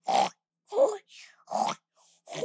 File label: oink, baby; added oink sounds for the baby honk social.